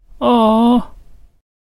aww

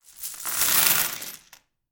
Coin Bag Emptying

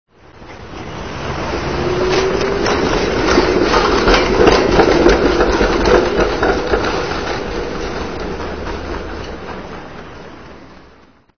tram

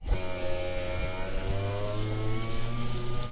windows roll up

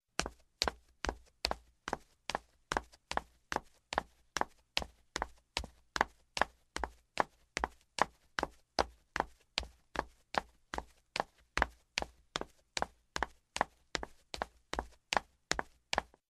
footsteps wooden steps female

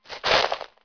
nature

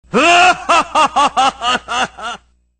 draclaugh; dracula

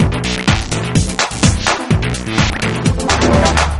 bits

